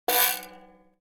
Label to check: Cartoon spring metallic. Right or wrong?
Right